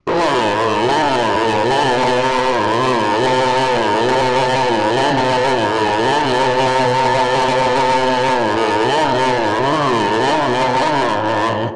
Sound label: jumpscare; hedgebro